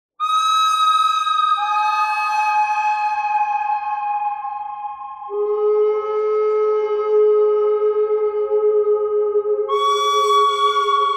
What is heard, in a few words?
bird thread simple